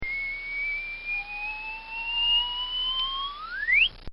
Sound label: Cartoon Sound Effects Rising; Cartoon Sound Design